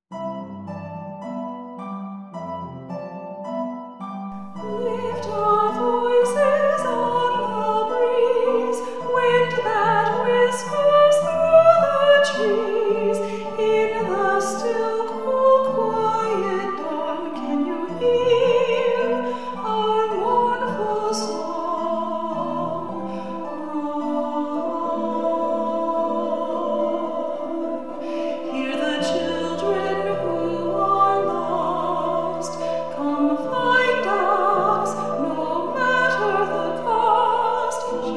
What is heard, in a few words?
Song of the Lost Children Vocal